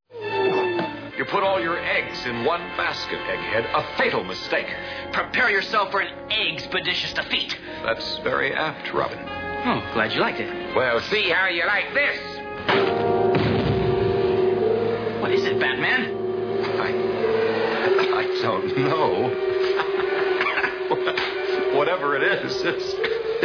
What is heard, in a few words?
egg laugh